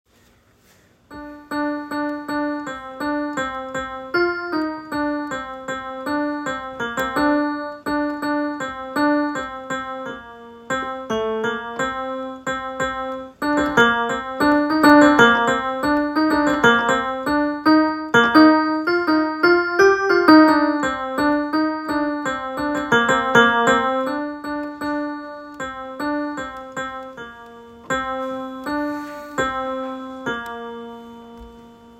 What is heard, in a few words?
convention theme music only; hsg convention 2023